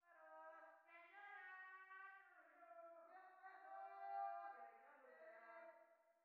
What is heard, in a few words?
amb People; netent